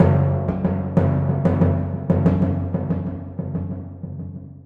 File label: Timp f sharp